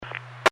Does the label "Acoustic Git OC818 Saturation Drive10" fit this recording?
No